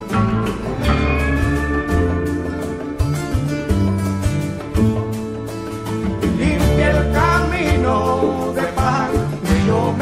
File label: a colombian ringtone